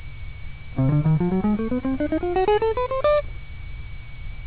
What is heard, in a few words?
sym dim scale 2nds